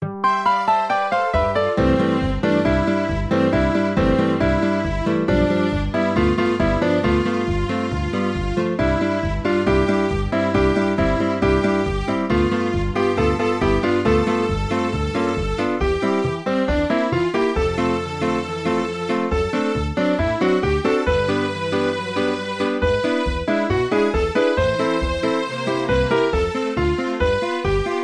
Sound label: ACCOMP